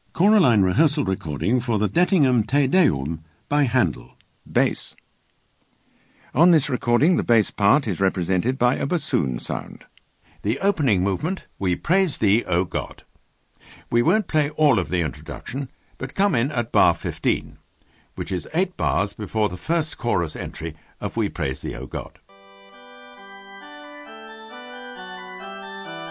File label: Bass; Handel; Dett Te Deum